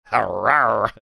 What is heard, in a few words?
growling